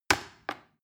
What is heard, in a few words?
plastic button little click heavy slow